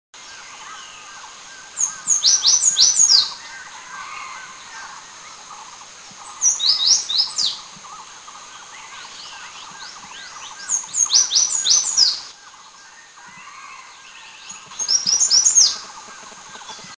Warbler; India; Eaglenest

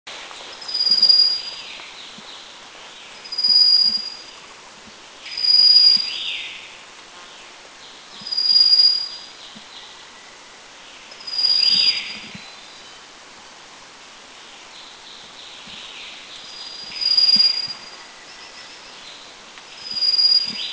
Rufous-faced Warbler, Taiwan

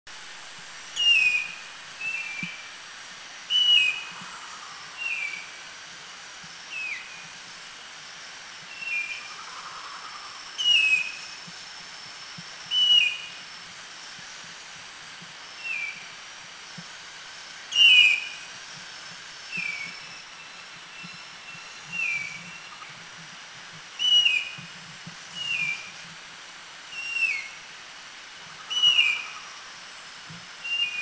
Silver-breasted Broadbill, Thailand

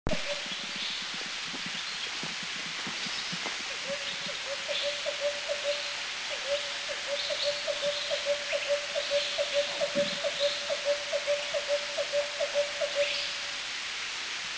Gold-whiskered Barbet; Thailand; Krung Ching

